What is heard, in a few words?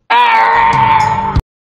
argh